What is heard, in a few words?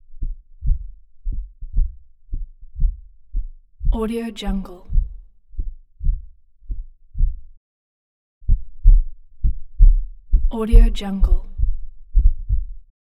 the heartbeat